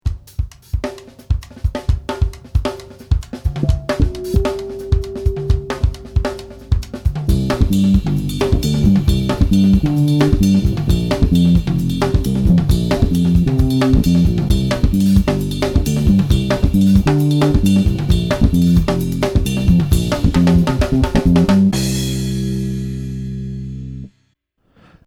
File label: v bass fretless